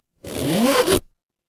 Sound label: paper fabric zipper